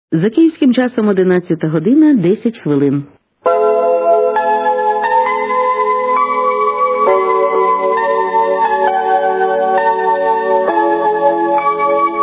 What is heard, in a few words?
interval signal; ur3; позивні 2001 р.; Радіо “Культура”